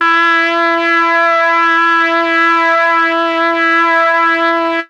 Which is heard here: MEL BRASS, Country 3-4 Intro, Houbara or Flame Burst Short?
MEL BRASS